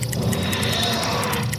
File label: flight loop, tardis; p00gie